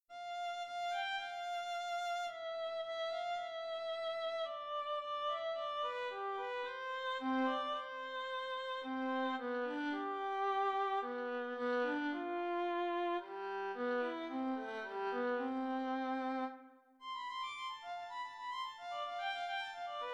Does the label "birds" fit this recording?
No